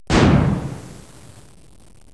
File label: EXPL; explosion sounds